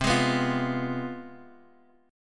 C Major 7th Suspended 4th Sharp 5th, down, Guitar, DADGAD